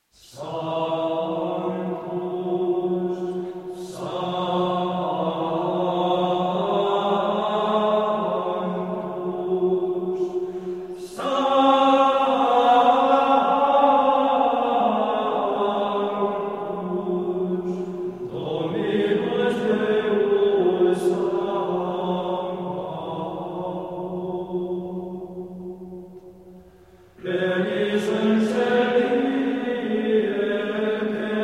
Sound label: Coro de Monjes Sanctus, Gregorian chant; Altspanische Gesänge